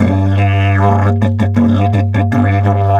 Didge 80 BPM, Didgeridoo; Big Fish Audio - Didgeridoo and Other Primitive Instruments

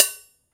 Prepared Drums

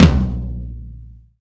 TOM, Laidback; Sonic Foundry (Sony Creative Software); Drum Tools

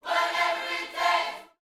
Vocal, Gospel, PHRASE; Spectrasonics

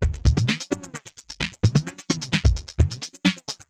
Cylon Beats (130BPM)

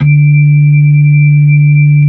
B-3 Hollow; Xfade; Keyboards of The 60's and 70's

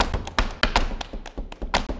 120 clap

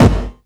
bass drum, Drum & Bass, 145 BPM; Ueberschall; Drum & Bass Resonance

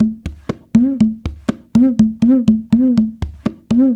CONGO, World Congas

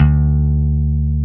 BASSES, Roland

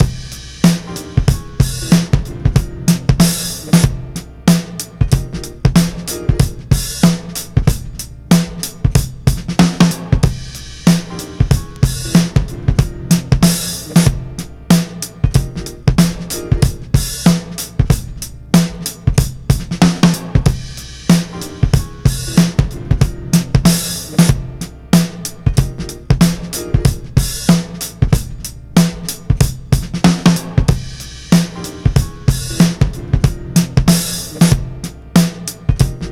94 bpm; MANIC COMPRESSION; 90 s Sample CDs; Planet of the Breaks

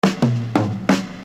Fill; Construction Kit; HIP-HOP; Sonic Foundry (Sony Creative Software)

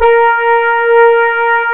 A#, Synclav FM, BRASS; Big Fish Audio

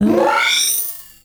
Awaah, Drum&Bass Hits & Bits; Total Drum&Bass; 90 s Sample CDs

